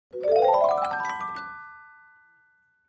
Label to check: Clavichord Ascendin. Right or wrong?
Right